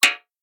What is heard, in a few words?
Rimshot